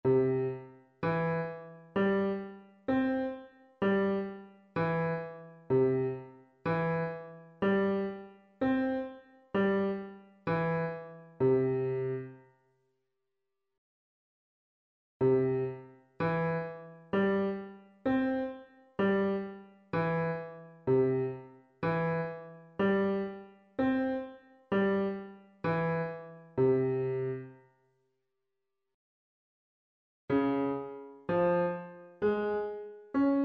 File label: piano staccato octaves low range 32 bpm